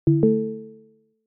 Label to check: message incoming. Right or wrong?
Right